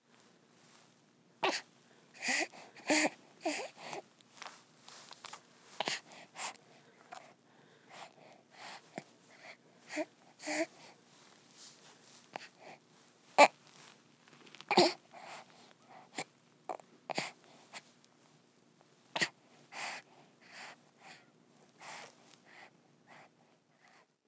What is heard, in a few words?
Human Baby Fuss Long; Babies (Sound Effects:Voice)